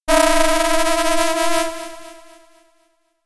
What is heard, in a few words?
Beam